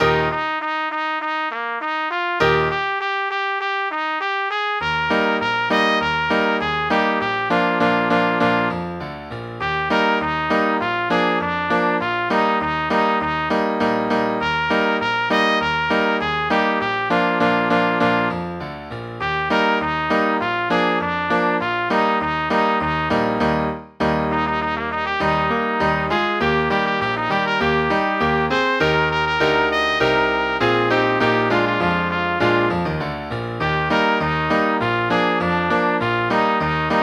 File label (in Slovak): Už ho vedou, Martina - pre trúbku a klavír